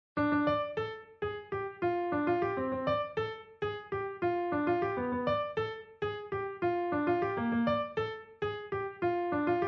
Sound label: piano